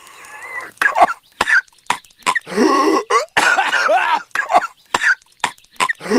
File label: choking; patrick